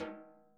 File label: SNARE, Interactive Drum Kits